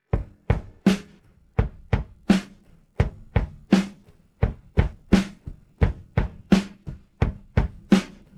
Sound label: pattern, drums